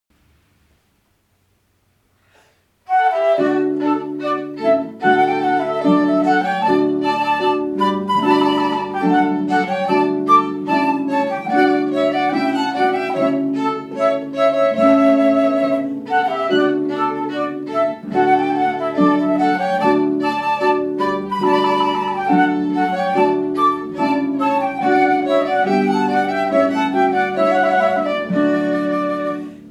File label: Rondeau, chamber music trio